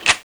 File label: mk3a1 clip out, weapons